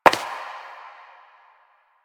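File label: Step Dirt, footsteps; Warframe; Koumei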